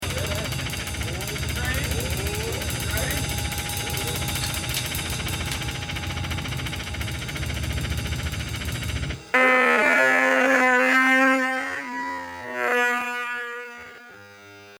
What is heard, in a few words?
blow hole